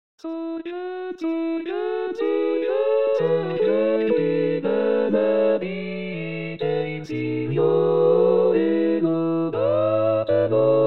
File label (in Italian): Sorgenti Delle Acque Coro; pasqua